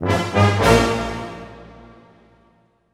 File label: brass accent; Orchestral Loops